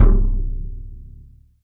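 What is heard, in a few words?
RESONATING KICK; 3D Drums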